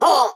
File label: Pecheneg Rogue Damage